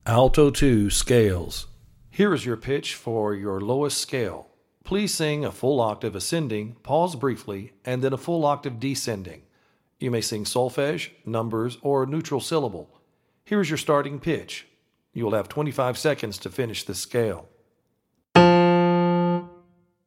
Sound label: Alto Scale Audition